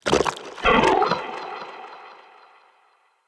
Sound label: Shrimp Vocal Pain, Creatures; Vocals; Baliq Shrimp Glade